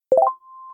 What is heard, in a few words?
subtle door open